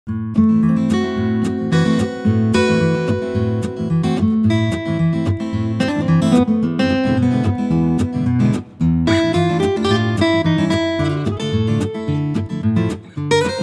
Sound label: Total Guitar Bundle; Ilya Efimov Production